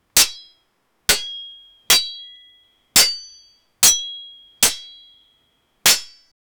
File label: loop of sword clashing in, battle